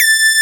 PL MOOG; Wizoo - Powered Nord; STEREO LAYER

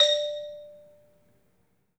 MARIMBA; 3D Sound; 3D Keys VOL-1

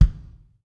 KIK, Real Kiks; Club-50 - Foundations Roland

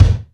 heavy k, Yamaha RX-5

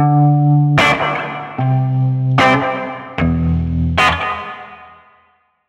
150BPM Guitar Clean D min; Antidote Advent

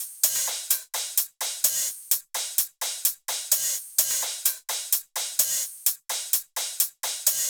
Loops 128BPM, Drumloops

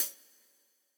Closed Hat, 120bpm, Old Skool House; House of Amnesia; Big Fish Audio; OSH Construction Kits

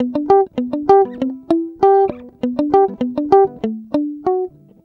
Acoustic Guitar 99 bpm G, African Guitars; Quazibeat; African Secret

